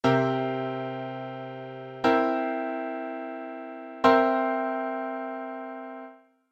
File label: Chords for Various Epsilons